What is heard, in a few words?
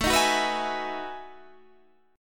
B 13th A, chord